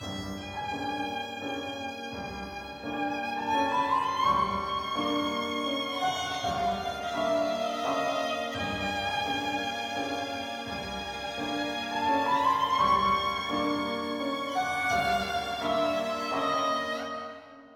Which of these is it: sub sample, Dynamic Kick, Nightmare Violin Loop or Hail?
Nightmare Violin Loop